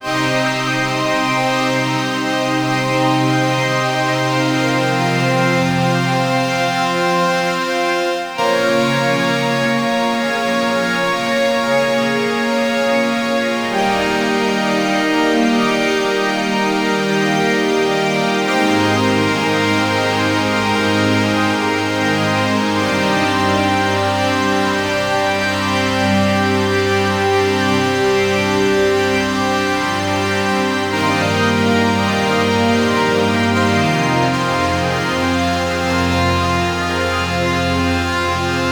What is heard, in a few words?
classic melodic synth wave, synthwave